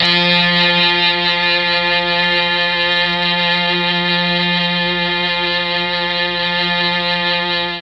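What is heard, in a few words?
F2 strings brass, mellotron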